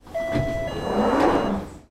transportation london underground train door open or close